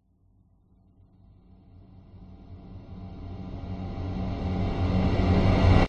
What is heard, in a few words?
riser mid frequency fear drama tension med light; zapsplat